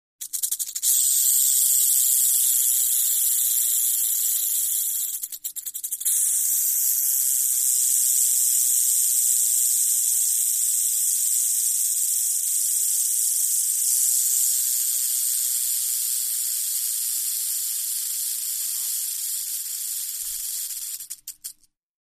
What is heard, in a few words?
Rattlesnake Western